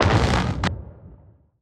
alien coil

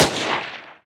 SMG single Shot; Weapons

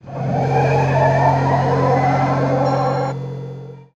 Power On Light

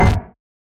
burst; Watcher

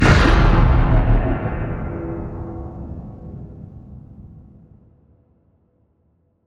bump Attack; Moloch

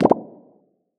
cell Death; Leucocyte; CARRIER

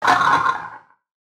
damage, fractal guardian; Legacy

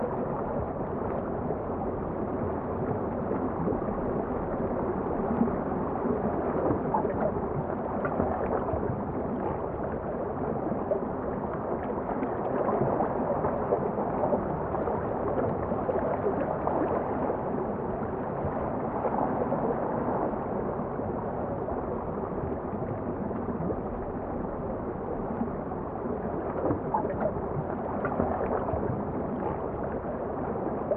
Water Ambience In

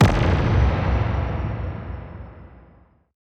Emp; Weapons

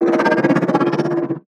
hammerhead idle

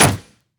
Hit Metal; Damage

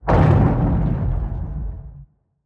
Structure Blunt; Damage

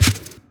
Footstep Mudraptor; Footsteps, Monsters

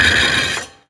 Mechanical Repair Fail

